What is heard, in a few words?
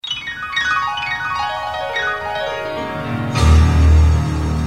Sms Piano; Boomtown